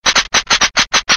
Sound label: Defective Turret dryfire; sp sabotage factory